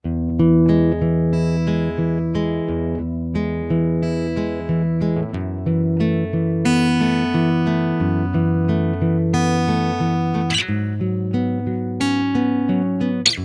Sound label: Guitar 90 BPM (Emaj); Guitar Loops; Evergreen; Ghosthack; Guitar Loops & Shots